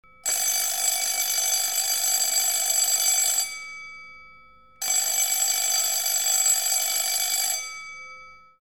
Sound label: Bell